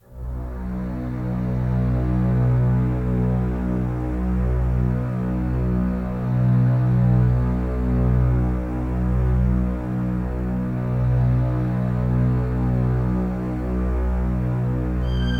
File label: Ambient, Strings Stem; ps4 ps5 switch windows xbox one xbox series xs